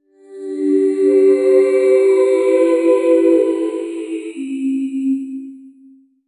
ai assistant startup sign

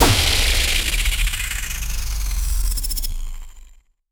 sparkling ricochet alien, blaster

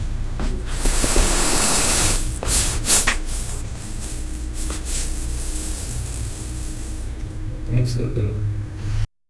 bossa, latin

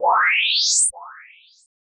level up sound effect, boost; SFX Engine

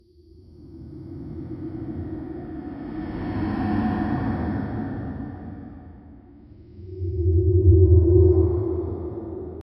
creepy ambient sound simi

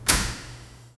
space station airlock doo, compressed air

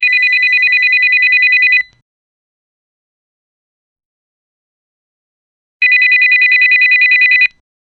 old corded phone ringing

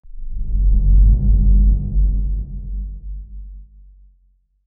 dark cinematic hit; mysterious

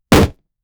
thud body fall; sound effect

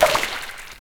splash water to the other, bucket

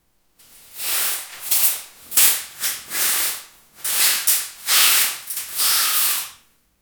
clean the house, cleaning

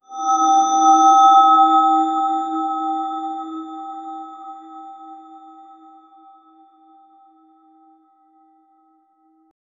impact bell suspense reve

